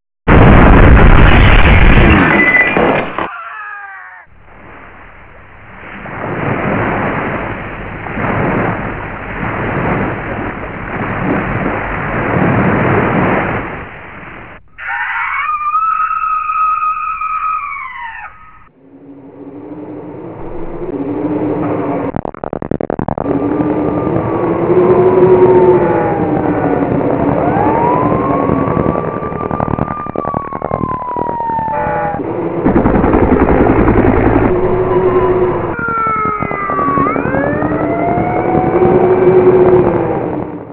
explo

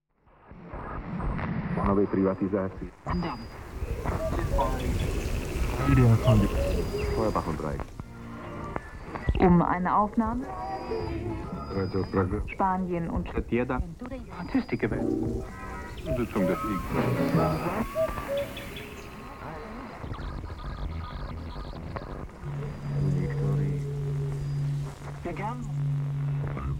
Soundscape

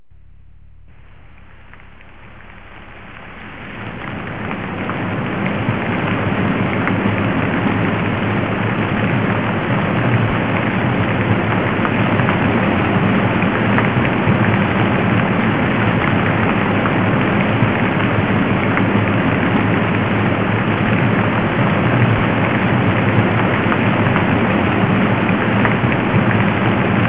rain gentle

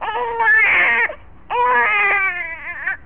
baby cry

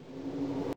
boom

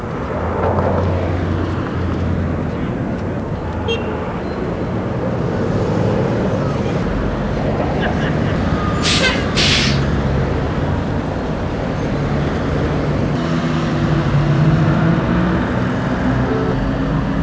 traffic

